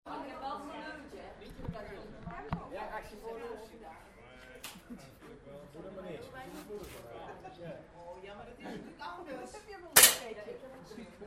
windbuks; fieldrecs

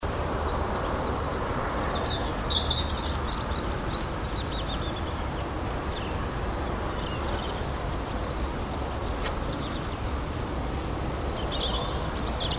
birdies; fieldrecs

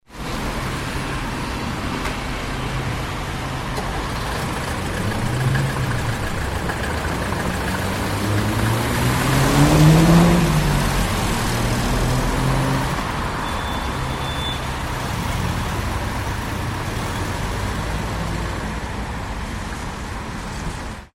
traffic; fieldrecs

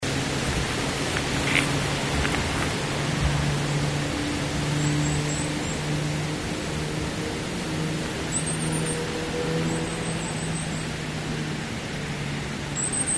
wind; fieldrecs

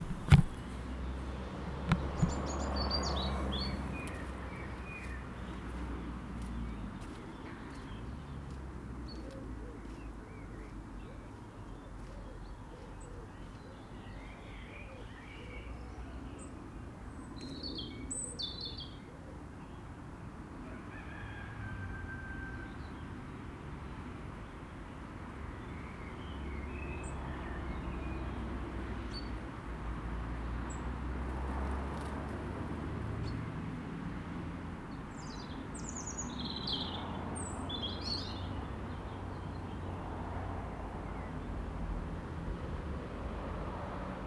birds and cars; fieldrecs

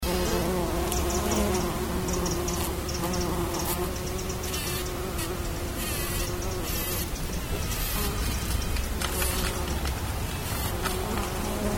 bumble bees; fieldrecs

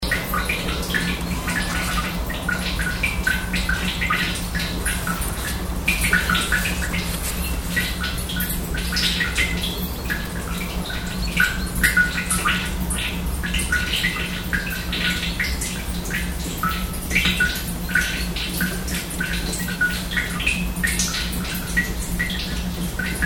water dripping; fieldrecs

